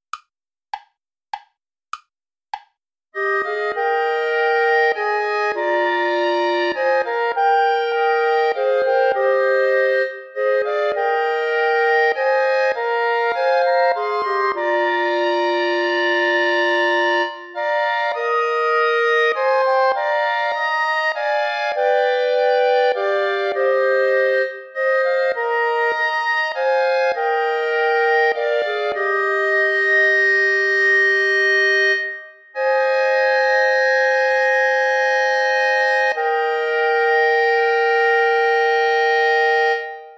now the sun declining rays play along without bass clarinet